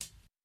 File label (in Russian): udarnaya ustanovka haj het